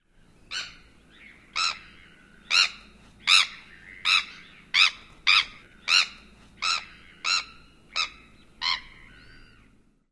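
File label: Bonaparte's Gull typical grating calls; AK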